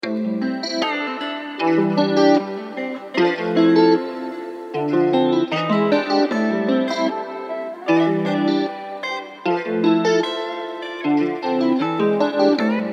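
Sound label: A minor 143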